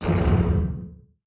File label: distance disruptor hit, weapons; Stellaris